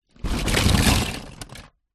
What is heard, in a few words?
leisure toy cars in cardboard box movement